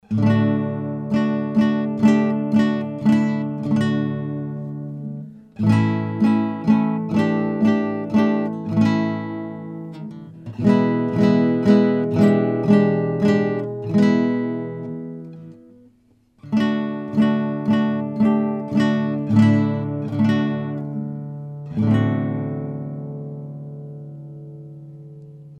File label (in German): Akustikgitarre; Austrian Audio MiCreator Studio und Satellite